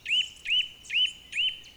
4x Truuui, Oiseaux

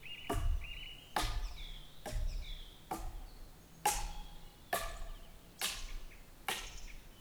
8x Coups Clairs, Percu Bois

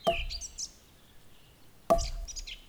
2x coups chouettes, Percu Bois

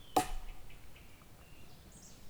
Baton Coup clair; Percu Bois

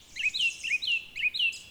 3x coucui, Oiseaux

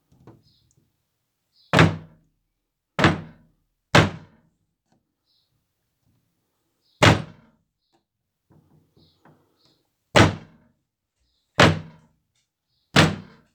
Bruitages bois choklairs résonn; Percu Bois

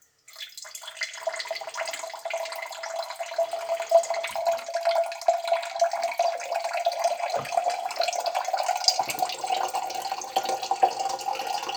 Coulis de l'eau montant dans évier; Bruitages; Eaux

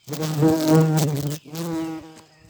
Bourdon jaune énervé; Vols Bourdn abeilles

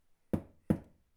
Toms proches; Percu Bois

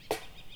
4x Baton Sec Tic, Percu Bois

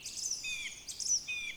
2x Trlip tuitii, Oiseaux

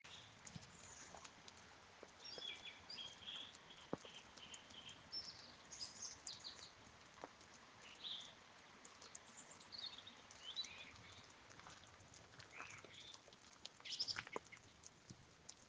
oiseaux

